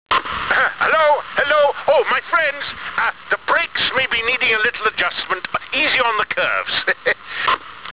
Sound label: brakes; disney